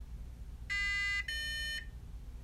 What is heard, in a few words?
Node Connection Successful